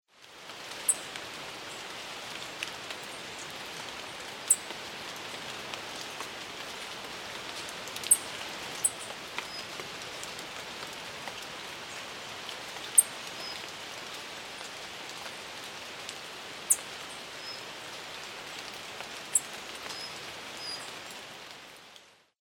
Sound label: Buff tailed coronet; پرنده تاجک دمنخودی